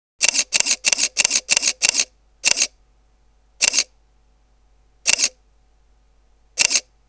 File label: Olympus E-400 continous